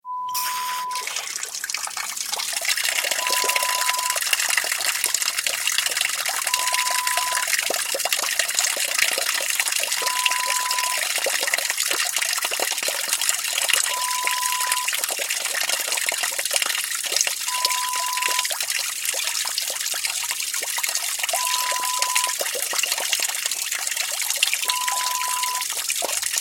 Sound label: Outside Faucet sound